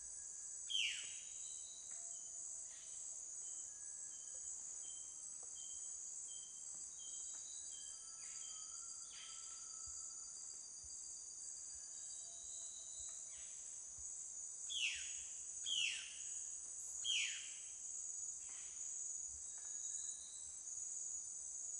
Peru Exterior Atmosphere Jungle Daytime Birds Cicada Crickets Insects; wilderness songs